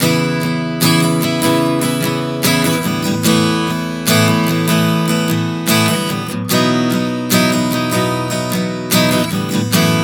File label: Progression 074 C#m7-Asus9-B-F#m11, Acoustic Guitar, Chord Progressions 074 bpm; Sonic Foundry; Songwriter Acoustic Guitar Companion